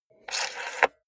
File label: cassette player antenna retract fast